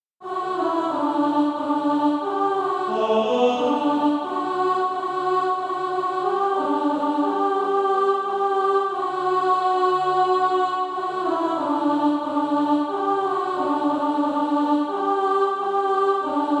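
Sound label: alto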